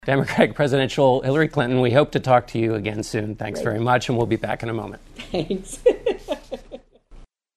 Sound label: LAUGH; hillary; AS SHOW ENDS